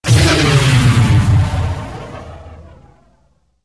player respawn

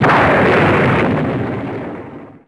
thunderclap

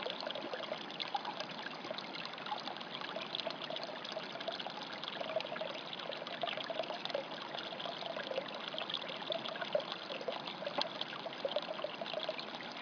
water

